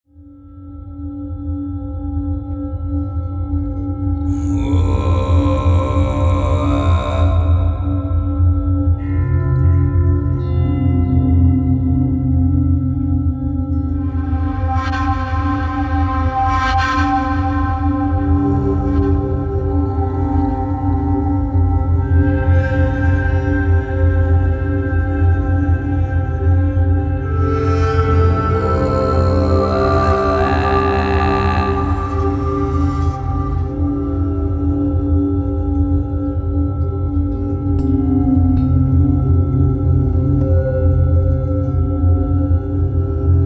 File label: mysterious tibet mystical east